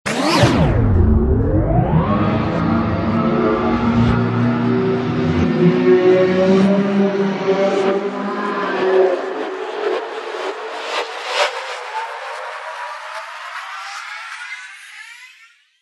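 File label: sounds of time machine or teleport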